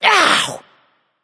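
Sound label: ouch, female; smite; voicepack; hera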